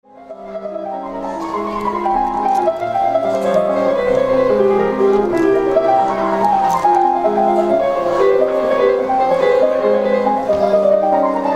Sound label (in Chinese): 钢琴演奏